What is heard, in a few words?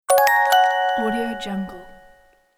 bells notification